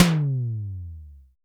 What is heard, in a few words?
BENDY TOM LO; POP-ROCK2